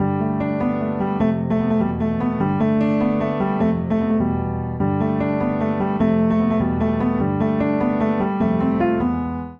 Phrase G Major 100 bpm, Upright Piano; MIDI Clips; In The Left Field; Upright Piano by Spitfire Audio; Ableton; Factory Packs

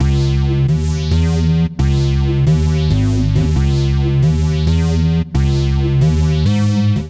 Rave Stab C# Minor 135 bpm, bassline; MIDI Clips; Tonal; Rave Class Stab; Skitter and Step; Ableton Factory Packs

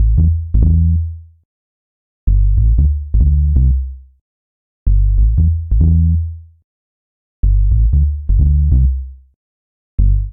Sub A Minor 93 bpm, Bassline; MIDI Clips; Tonal; Dirty; Ableton; Factory Packs; Chop and Swing

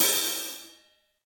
Hihat Open Session Dry; Drum Hits; Ableton; Factory Packs; Session Drums Studio; MPE Hits

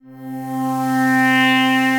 Pad, Synth Pad; Vibrations by ModeAudio; Kind Heart Pad

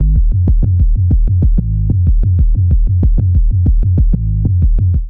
Boom Butter Kit, Drums; Ableton; Factory Packs; Chop and Swing

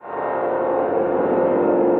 Granulised Guitar; Dark Textures

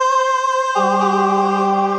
Semi-Synthetic Choir; Chromatic Voices; Choral Voices; Ableton; Factory Packs; Voice Box

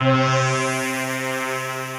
Demonic, Retro Synths; Ableton; P-50 Linear; P-50 Structures

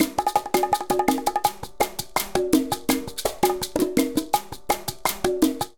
Danzonete 166bpm, Latin Percussion; Ableton; Factory Packs

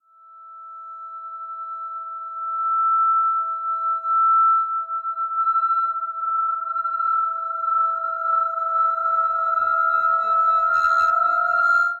Sinus Drone Em 80 Bpm; MIDI Clips; Instruments; Ableton; Drone Lab

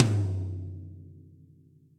Tom High Floor Studio Basic; Drums; Drum Hits; Ableton; Session Drums Studio; MPE

